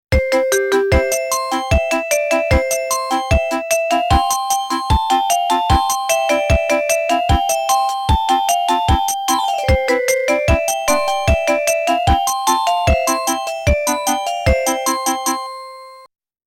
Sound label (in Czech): melodie